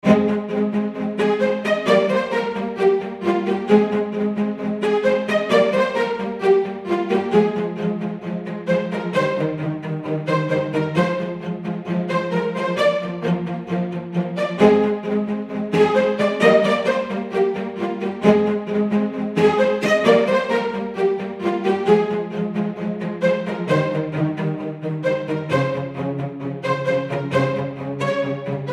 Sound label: Spiccato Alt Bowing